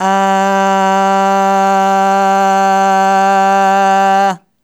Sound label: Aah Long G2 Dry; Harmonic Building Blocks